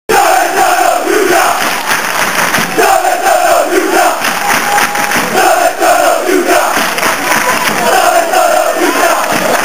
dove sono ultras, Chants; Livourne

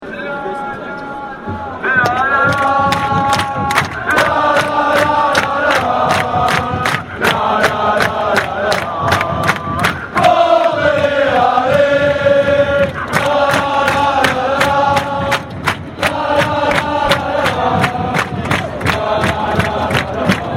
Bordelais Allez, Chants

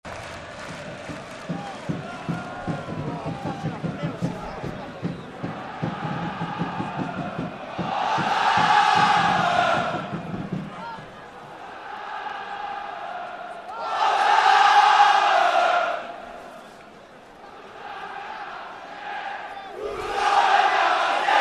Chants du Stade, Aux armes; Olympique de Marseille

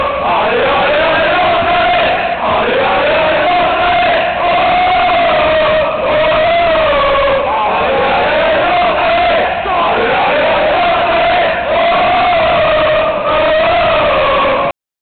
Allez allez allez nantes allez, Chants

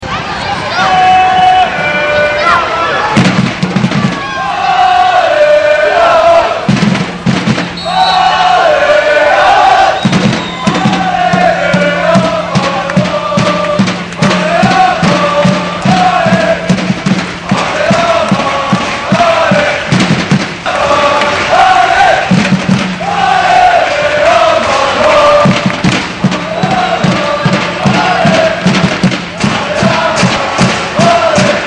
Allez Laval, Chants

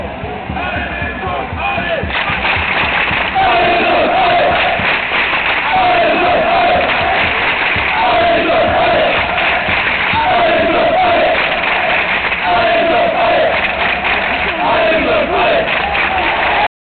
Allez les jaunes allez, Chants, Nantes

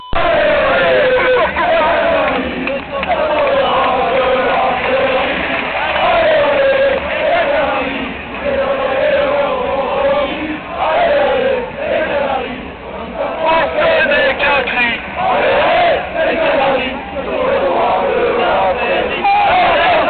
Allez les canaris, Chants; Nantes

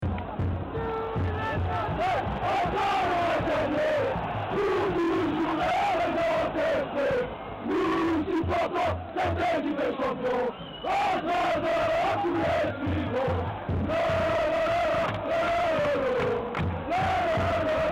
Nous Les Nantais, Chants; Nantes

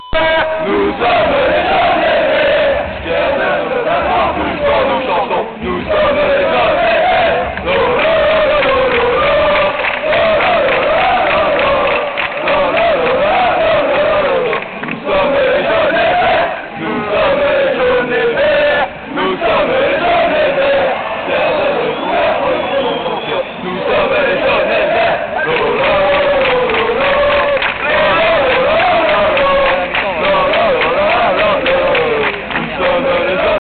Nous sommes les jaunes et vert, Chants; Nantes

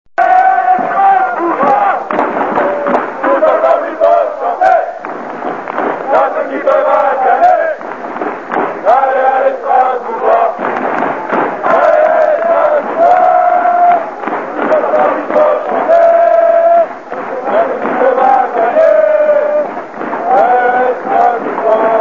allez allez strasbourgeois, Chants

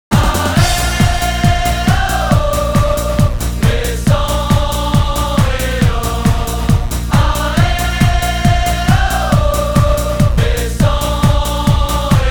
ah eh ah oh les sang et or, Chants; Lens

